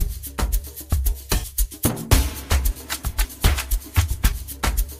Bass Destroyer

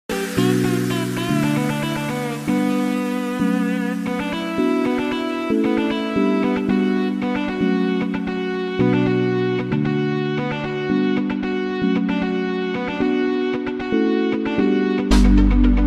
House Party

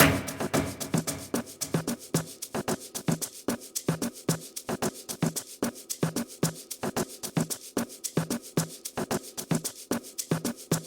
French Bells

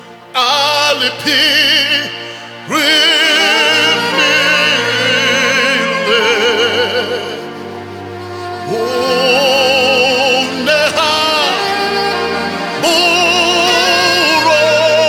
Hymns on Strings

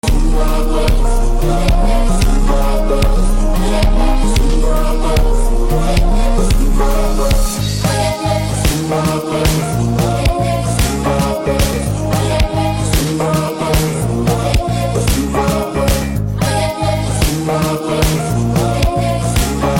Amapiano Remix

